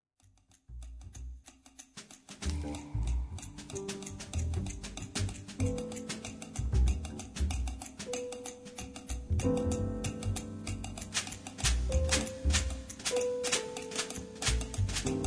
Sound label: funk